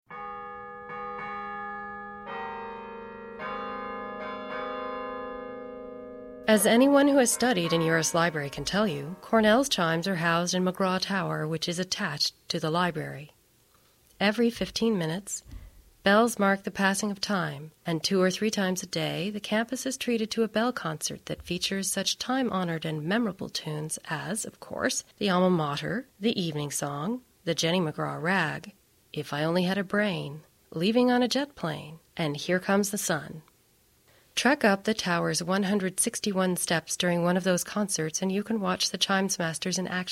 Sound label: chimes